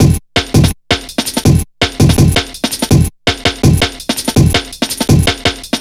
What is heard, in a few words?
165, Drum Loops, Drum & Bass; Total Drum&Bass